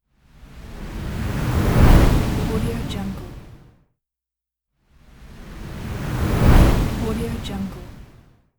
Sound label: windy flyby whoosh